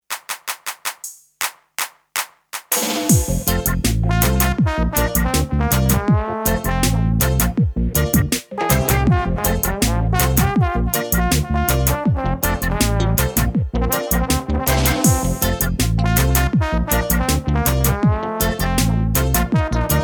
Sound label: Trombone Reggae